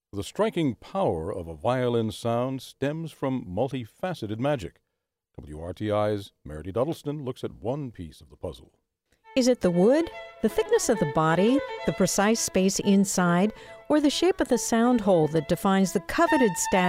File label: Violin; Sound Hole